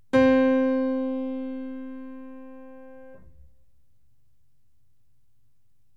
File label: HAMBURG GRAND PIANO C4